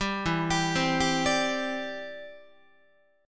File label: E Minor 7th Sharp 5th E, Guitar; arpeggiated